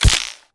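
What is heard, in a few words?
bird arrow, attack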